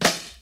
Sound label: clean snare drum f key, acoustic snare sound tuned to the F note; snare drum samples